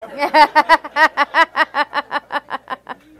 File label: crazy bot laugh; No Agenda Episode 803